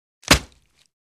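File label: Tomahawk Single Impact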